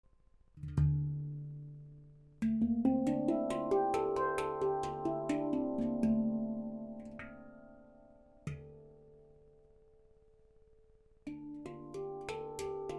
D Kurd 10 brut, handpan re kurd 440hz

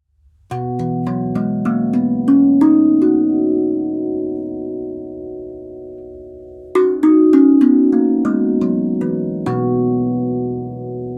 Major B, rav vast si majeur

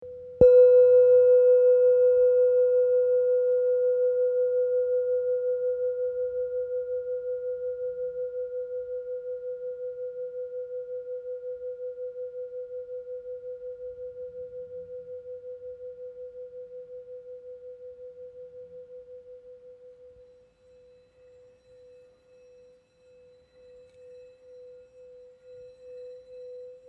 black om

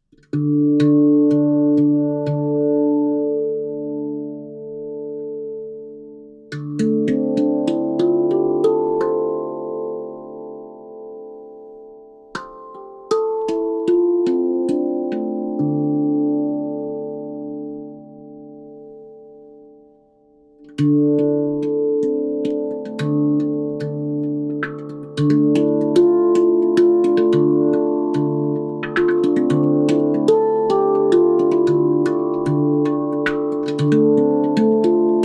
RAV Moon D Celtic; rav moon re mineur celtic d

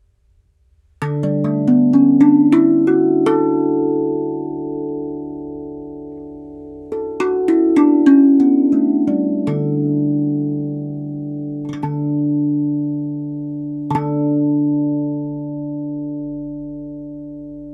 Major D, rav vast re majeur

